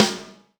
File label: LW PIC RM, Drum Mashines, SR-16 DRUMS; EdgeSounds